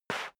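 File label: step sneaker concrete slide short, footsteps; schritte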